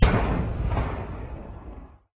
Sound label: firework thump; Bej Classic